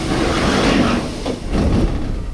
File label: Door Open, Train; 141R; OpenBVE